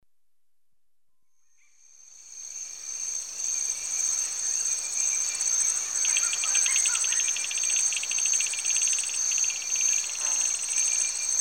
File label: Cameroun- Turaco Birds, insects and tree frogs; The BBC Sound Effects Library; Africa- The Natural World